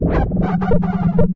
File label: dog phrase; npc; source engine; episodic